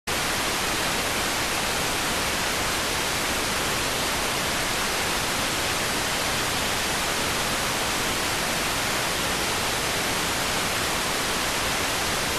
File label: rtty rx